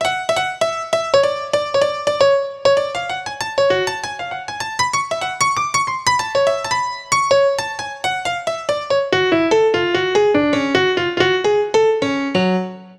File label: Piano Solo, Cinematic Funk; Sony Creative Software; Urban Tone Poems; Solos